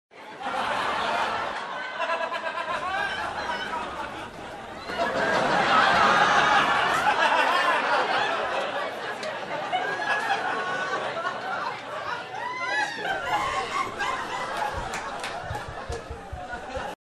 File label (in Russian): smeh ljudej v zale